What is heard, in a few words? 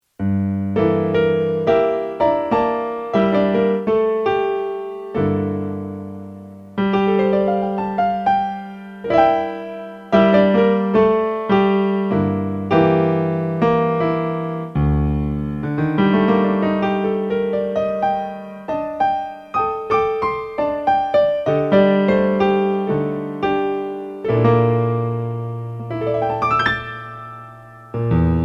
casio PX150